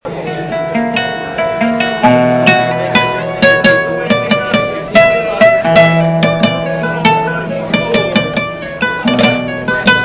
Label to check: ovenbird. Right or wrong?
Wrong